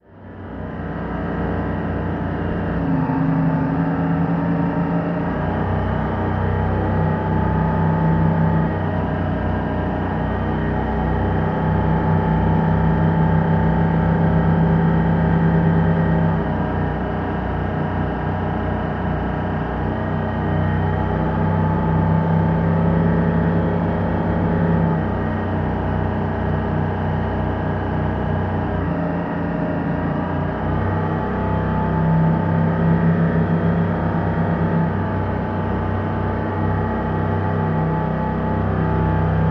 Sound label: Military Pbr: Onboard Medium-fast Speed Cruise With Sligh Throttle Adjustments. Dual